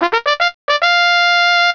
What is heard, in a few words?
CHARGE; MPG-Net (USA); garden